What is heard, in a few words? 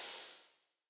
Open Hats